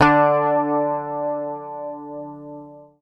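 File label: bass, D3, left channel; PICK; Sampleheads; Will Lee Bass Library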